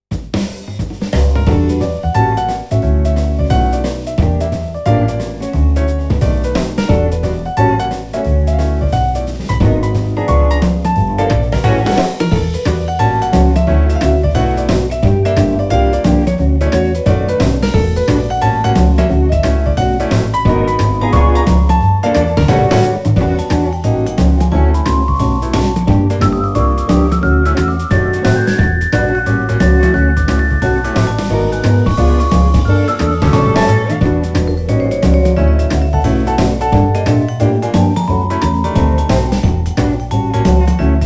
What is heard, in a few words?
Jazz 5 piece; SE Cool Jazz 5piece; Sony Ericsson; PlusFourSix